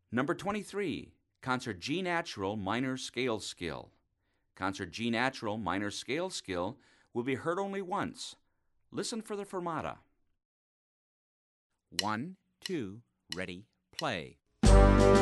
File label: concert g natural minor